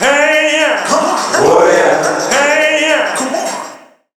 RI VX, Roots Funk; USB Soundscan vol.41; AKAI

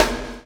CHAIN; Spectrasonics - Backbeat

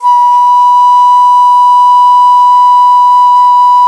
DIZI, Winds

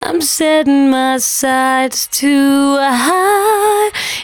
113bpm C#, Velvet Vocal; Zero-G

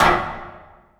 Oneshot; Sonic Foundry (Sony Creative Software); Esoterik Beatz; Spitter

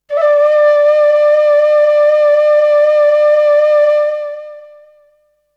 Flute; ZERO G - Datafile DISC 3

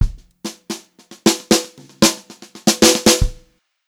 152 FILL, Brushed Drum Loops; USB Soundscan vol.39; AKAI; HOTROD

